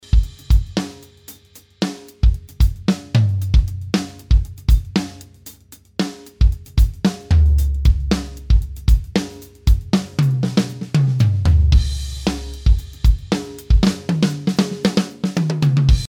Drums ohne Roommics; X32 Drumrec Mix-Evo

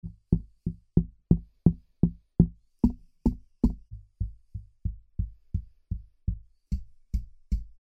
Beyer MKV8 DIY Shockmount Beispiel; Shockmounts

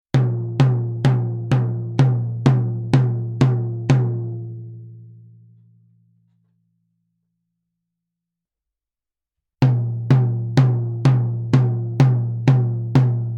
C414 Kugel alle Pos

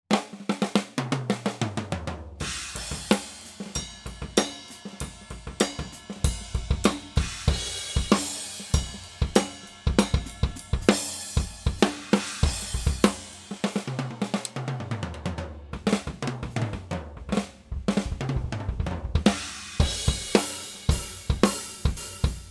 Vergleich H5 mit und ohne Stuetzmikros

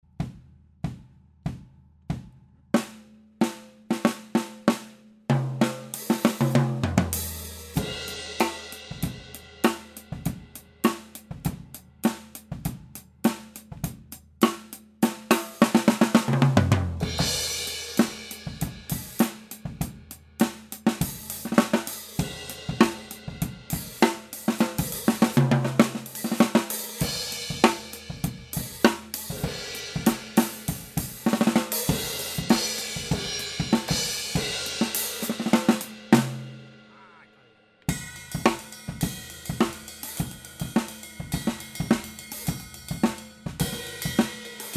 Nur Overhead Rohsignal, Drums; Handyrecorder; DF-Nord Drums Handyrecorder

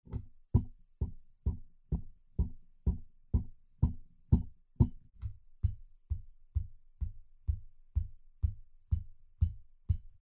Beyer MKV8 DIY Shockmount Beispiel; Shockmounts

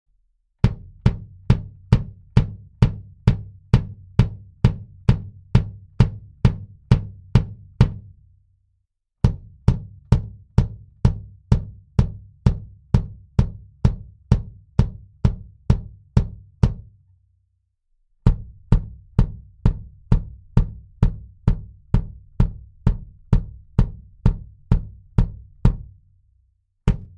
BD Mic Test Pos E all

